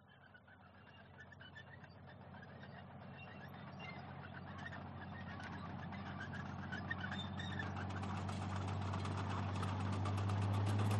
warfare tank matilda mrk 2 1939 pass by close

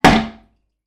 household toilet seat lid down; sound effects one

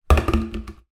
foley tub plastic drop onto floor

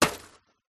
foley charcoal pieces impact in metal fire pit; zapsplat

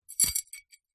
medal metal on ribbon movement

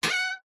Wood Stress Creak Squeaks; DPA4060; pmsfx

